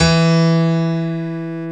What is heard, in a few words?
PIANO, E; SY PIANO; Best Service ProSamples vol.10; AKAI; House